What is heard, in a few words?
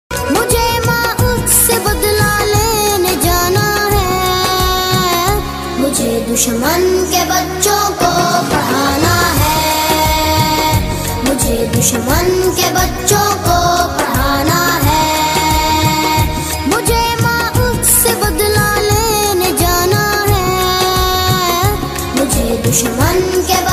pakistani ringtone